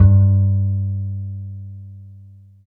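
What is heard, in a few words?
bass G2; East West - Phat & Phunky CD2; 90's Sample CDs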